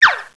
Zip; Princess and the Pea; SoftKey

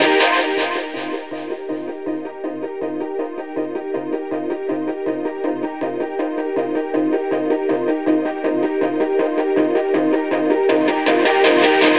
AMBNT 160; Ambient

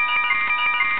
Magical Chime; The Haunted House 1.0.2

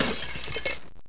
glas brk; landslor

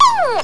PING

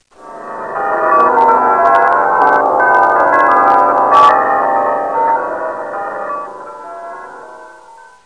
WINDBELL

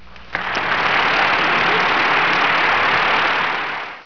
applaud

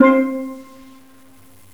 steel drums; GMPlay; Percussive

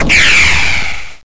gun

